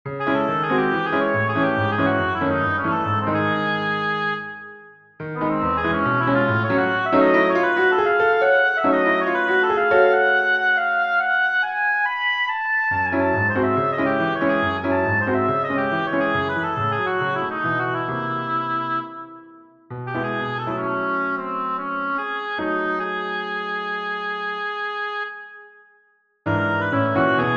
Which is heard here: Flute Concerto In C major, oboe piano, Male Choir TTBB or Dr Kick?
oboe piano